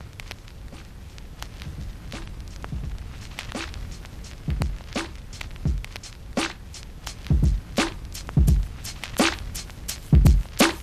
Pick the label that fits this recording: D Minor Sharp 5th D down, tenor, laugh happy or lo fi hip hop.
lo fi hip hop